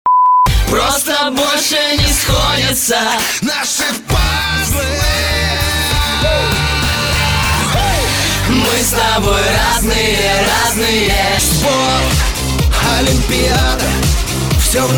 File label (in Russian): vokal